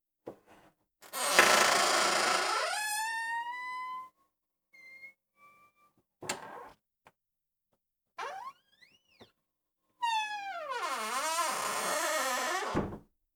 door; 高音で鳴るドアの開閉

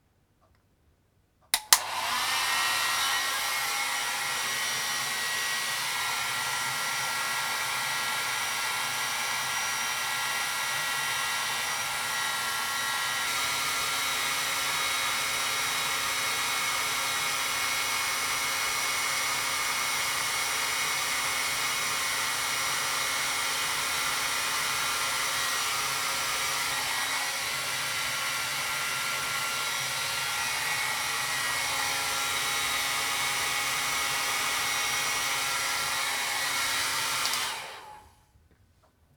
machine; ドライヤー１

